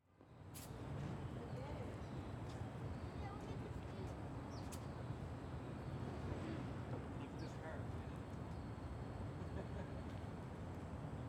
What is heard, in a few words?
Ambience Shopping Area With People Outdoors; AdobeCC